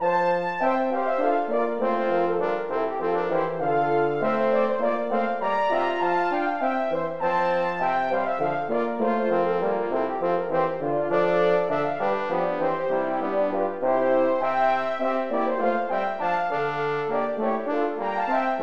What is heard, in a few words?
hornpipe